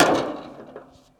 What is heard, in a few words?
bleacher land; Golden Crayon Sounds